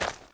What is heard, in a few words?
walk light; left